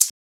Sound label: Shaker; FLPRO